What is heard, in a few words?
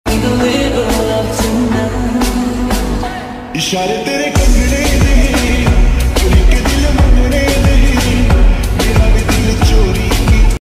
lofi remix hindi ringtone